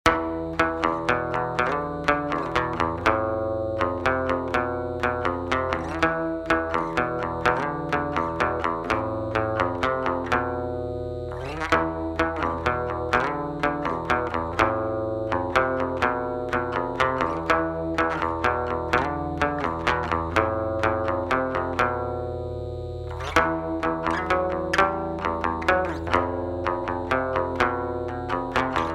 guitar interlude

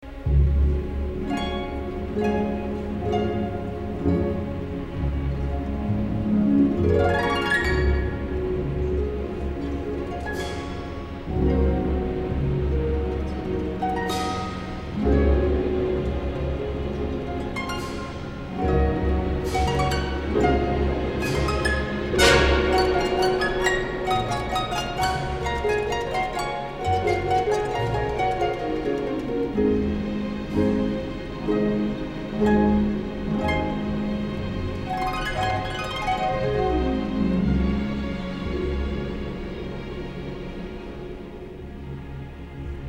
harp maestoso

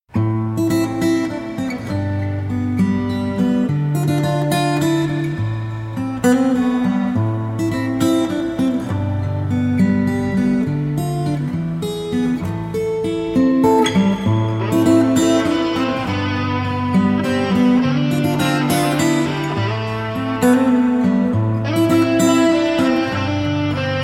acoustic

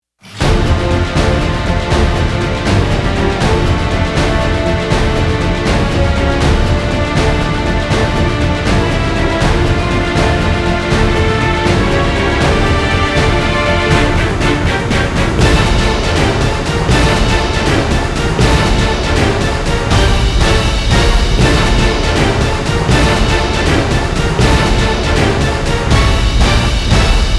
no choir

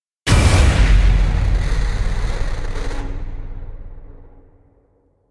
destruction slams nuclear

